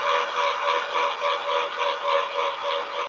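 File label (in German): Bohrmaschine periodisch belastet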